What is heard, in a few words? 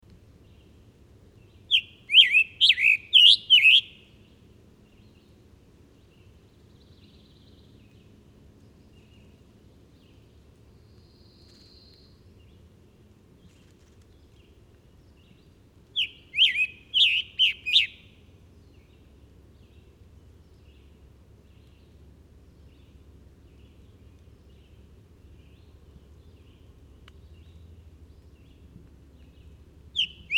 Scarlet Tanager Piranga olivacea; birds-that-eat-grape-jelly